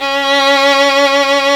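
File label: STR VLN, violin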